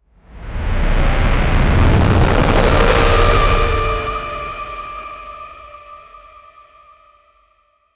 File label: create horror jumpscare s